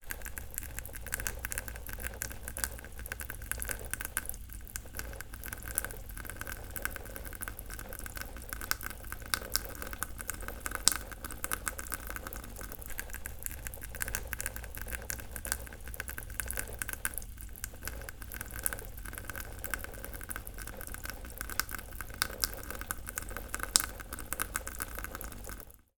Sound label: household water from tap slow trickle onto sponge